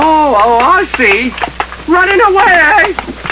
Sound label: running; h2siege; taunts